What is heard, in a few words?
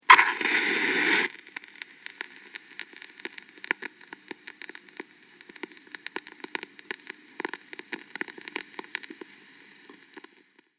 Match Lit Burning Flame